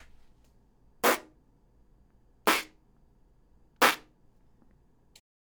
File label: clap; ヒューマンビートボックス